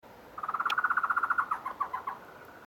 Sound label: Bird Sound